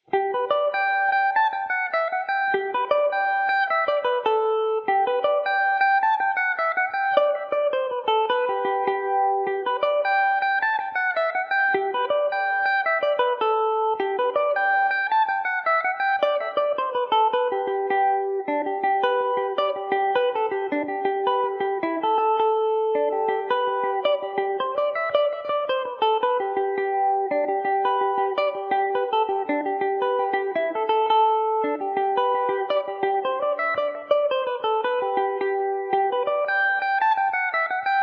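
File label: G major mandobird, irish mandolin; Epiphone Mandobird VIII electric mandolin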